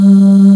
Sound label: VOX, CRYSTAL SYN; AKAI S6000 CD-ROM - Volume 1; SYNTHESIZER